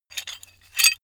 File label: metal handling pieces